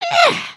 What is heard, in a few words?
wake up; emotes